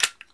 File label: sil off, weapons m4a1; mw2